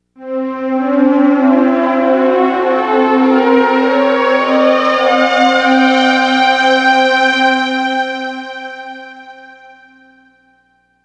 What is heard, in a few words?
Moll II, modes